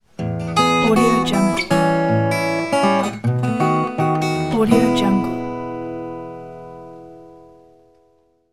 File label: acoustic guitar blues links